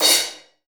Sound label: CRASH 3 R, DRUMKIT